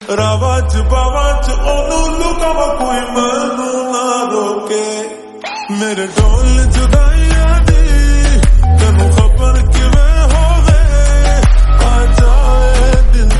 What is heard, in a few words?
slowed amp reverb